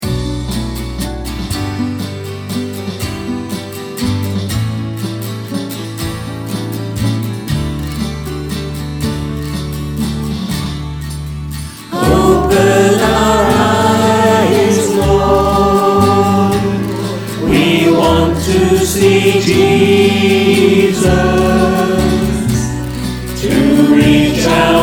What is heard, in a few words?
choir